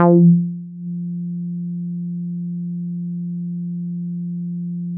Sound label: Synths BASS